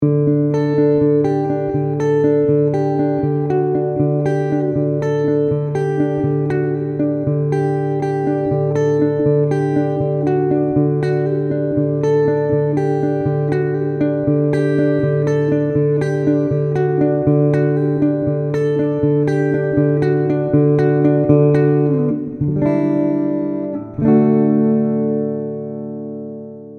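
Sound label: ibanez premium 721fm-bif clean picking neck pickup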